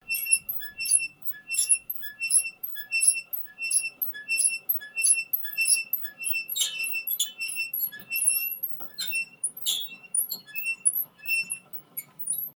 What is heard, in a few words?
wrench effect of turning a mechanical transmission; stroy